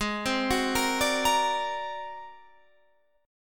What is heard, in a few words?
G sharp 9th Flat 5th G sharp Guitar Open F; G#9b5 arpeggiated; JGuitar